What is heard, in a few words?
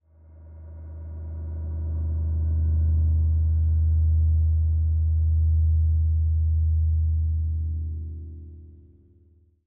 gong undertone; angband